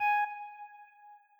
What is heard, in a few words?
Beep